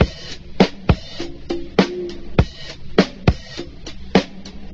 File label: gasses escape, Drum loops Funky